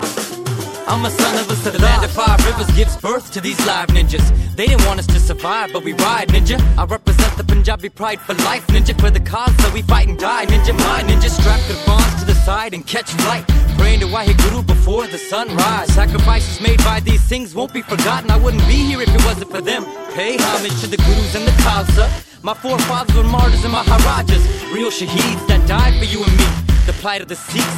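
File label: Ringtone, Punjabi Pop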